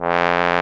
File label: Trombone, Solo Brass